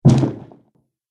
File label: Body Impact - Blood And Guts; Injury; Injury Sound Effects